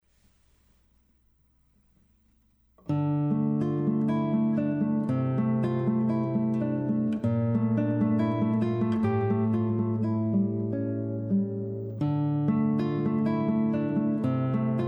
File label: Prelude, guitar